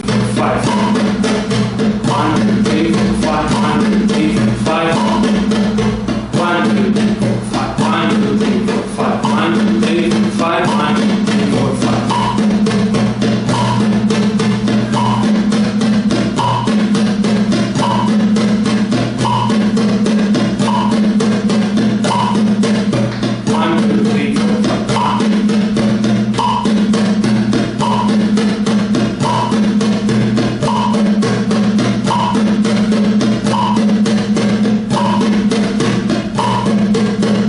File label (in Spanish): Rasgueo, guitar